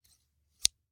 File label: Scissors, snap, snip, shear, large, single; Eiravaein Works; Vaeyan IV